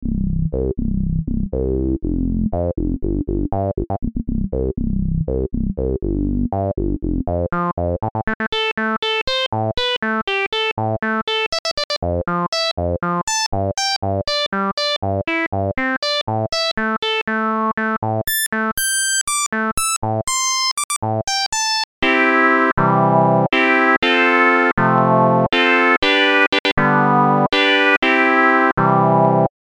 Tassmann VSTi filter, lpf 2 pole q075; filter demo; aas; tassmann 4

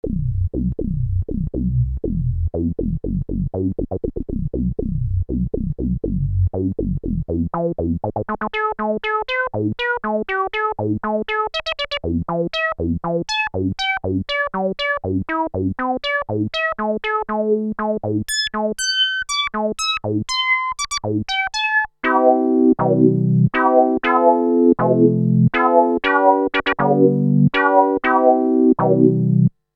crumar bit 99 filter lpf q050

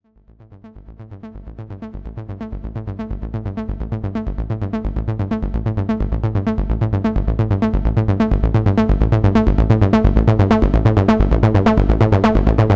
novation ks5 303 acidline; TB: All by Novation KS5, played with internal Arpeggiator

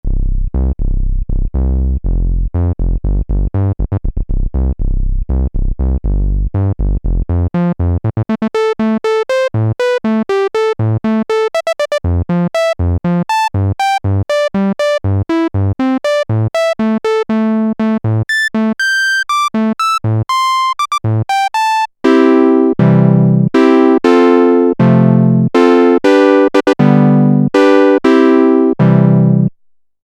Alesis Micron filter, lpf 3 pole q000

